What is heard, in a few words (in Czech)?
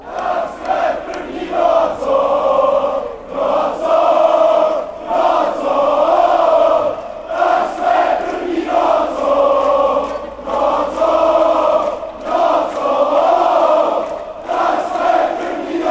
tak jsme prvni, chants